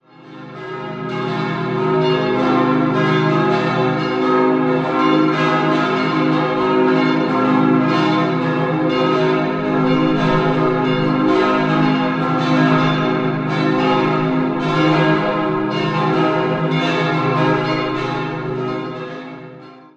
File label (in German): Maxhütte-Haidhof, Stadtpfarrkirche, Glocken; Stadtpfarrkirche St. Barbara